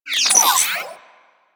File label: digital glitch; Warframe; Corpus Railjack; Ambience